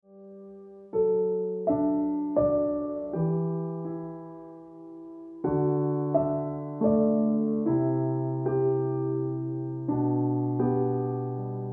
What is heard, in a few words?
Piano Music